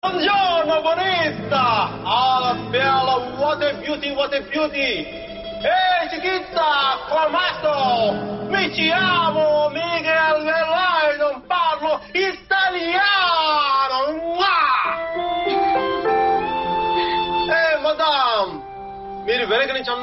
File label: sicilian italian ring